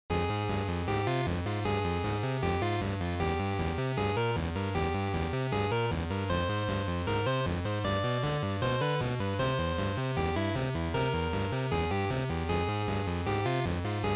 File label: GB RPG Battle